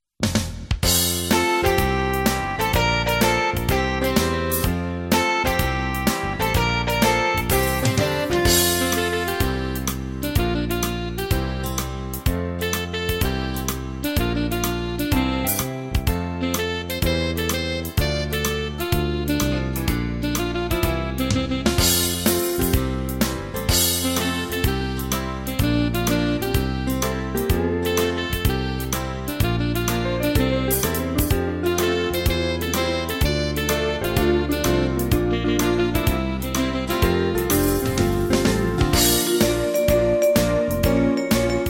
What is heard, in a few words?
music